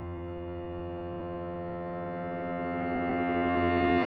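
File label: piano In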